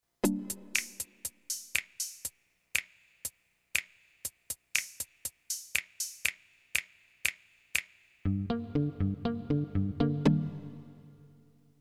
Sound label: Korg EK 50 L Style Ocean